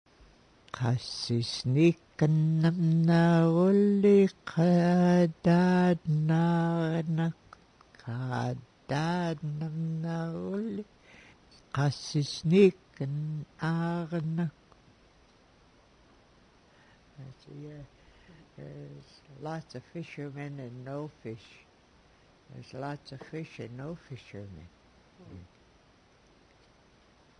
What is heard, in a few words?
Fisherman song